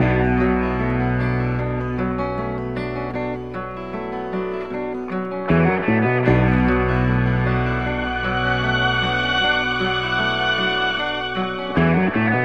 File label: guitar, Loops